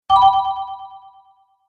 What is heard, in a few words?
Magical Cartoon Spell Stop; Magic Sound Effects